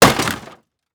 Impact Metal Smash; Impacts

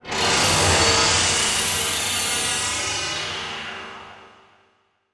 Title Transition Distortion; Adobe; Production Element

